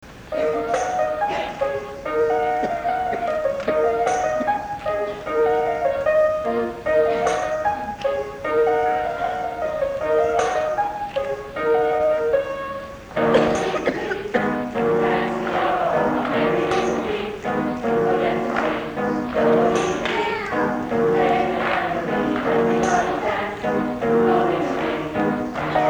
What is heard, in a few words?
Hanukkah Beat